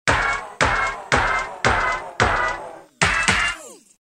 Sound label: Street Stab; Roland M-DC1